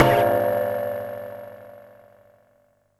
Percussive Stab

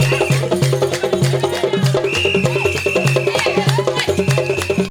KANKURAN DANCE; Best Service; World Colours

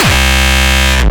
Speedcore Kick

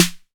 808 SD Tape, Snare Drum; Tape808; Goldbaby Tape808 & Tape909

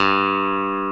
CLAVINET G2; E-MU Formula 4000 Series Vol. 3; Analog Odyssey

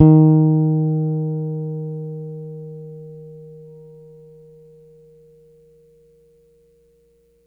Gs D#4 hard, Bass, Pedulla Thunderbass; Giga Samples Collection

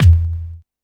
BD, Dance Mega Drums, HIP; 90 s Sample CDs; Best Service

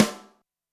snare, Zoom MRT-3B

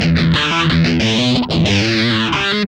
GUI 90 LICKS

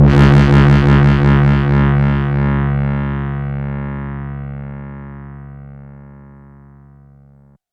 heavy bass one-shot; KJ Sawka - Drum n Bass & Beyond

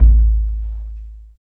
DEEP DRUM; East West - Phat & Phunky

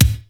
CRUNCH KICK, effected drum; Crypton - DrumBase5000

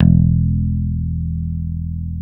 DAN OPEN E2, Bass

